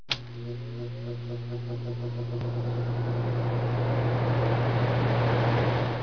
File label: electronics